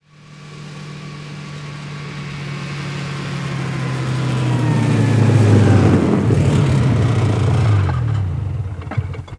snoscooter

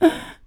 Innpust

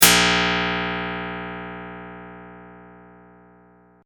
Gitarstreng

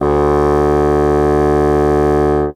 fagott

